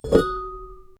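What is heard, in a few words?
lightweight metal lid lift; workshop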